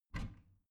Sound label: bathroom cabinet warped open soft